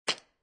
Jar With Beads Place On Table, Foley, Jar, Small Plastic Jar, Filled With Beads, Placed On Wooden Table; Set Down; Set Downs